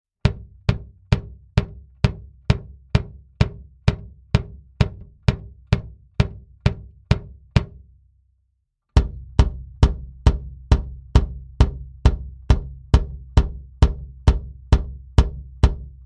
BD Mic Test Pos B all

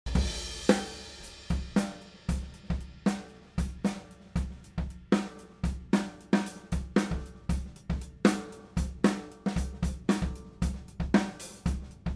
C414 OH solo ganzes Set flat; C414 - nur Overheads - ganzes Set - flat; Glyn-Johns

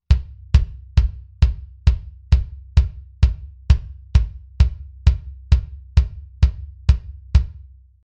BD Mic Test M201 solo wet

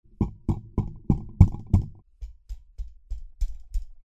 Beyer MKV8 AKG H100 Beispiel; Shockmounts

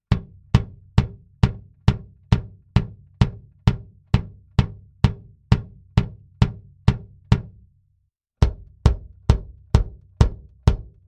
BD Mic Test Pos D all

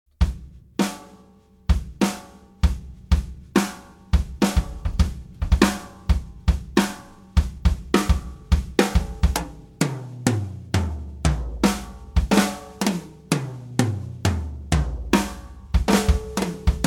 e664 OH Kick Snare ganzes Set; e664 - mit Kick- und Snare-Stützmikros - ganzes Set - mastered; Glyn-Johns

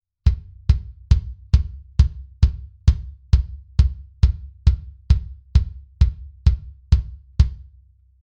BD Mic Test Tech II solo wet

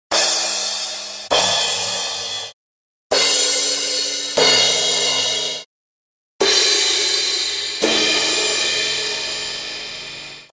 Vergleich Paiste MP3s echte Becken

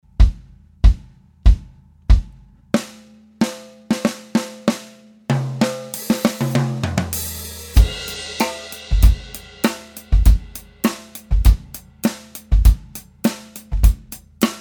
Gesamtmix bearbeitet Snare aligned, Drums Handyrecorder; DF-Nord Drums Handyrecorder

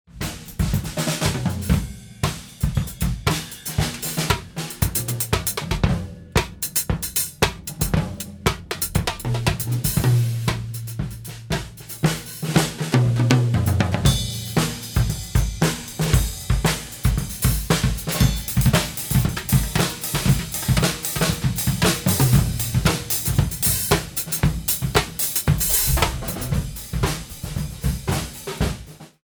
Gruppengedengel, drums; Handyrecorder; DF-Nord Drums Handyrecorder

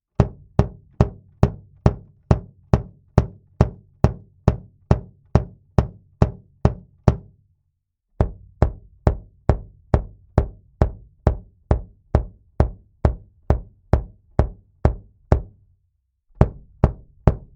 BD Mic Test Pos G all

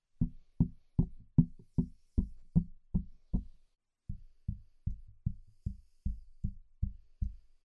Beyer MKV8 DIY Shockmount Beispiel; Shockmounts

